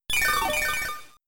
Soft Boiled

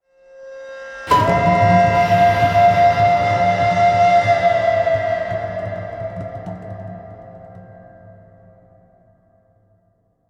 Lose; Ozymandias

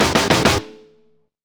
Fill; Sly Cooper and the Thievius Raccoonus 2010 PS3

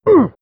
Grunt

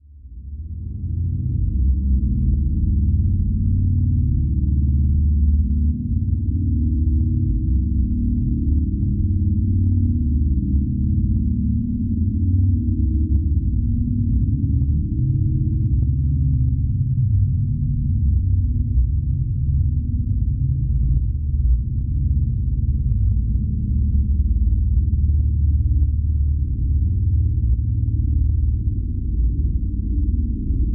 Rumble, Jungle; Far Cry

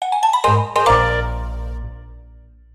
Round Complete; Picross X Picbits vs Uzboross

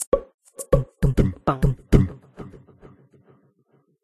Jungle Ambience Beat Short; And Yet It Moves

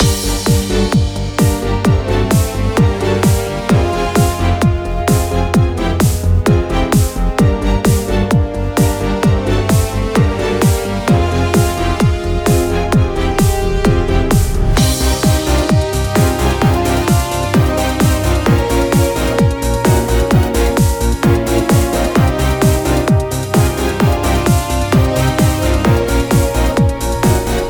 Pirate Loop

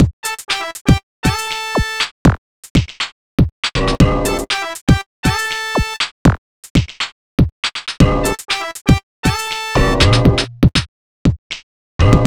BGM Shuffle; Irodzuki Tingle no Koi no Balloon Trip; NDS

